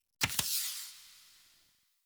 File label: Tarot UI Card Slide In; Warframe; Harrow; Tarot Emotion Choice